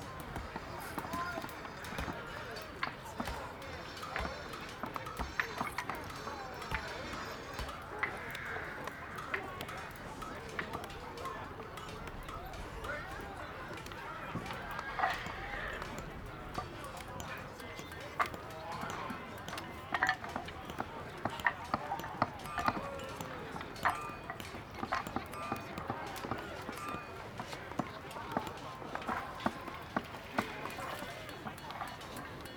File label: camp, ambience; chelaxian